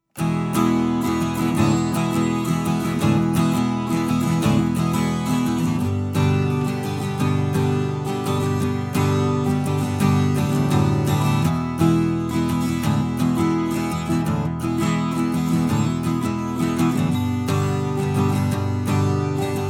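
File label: Guitar Orpheus Kugel; Digi002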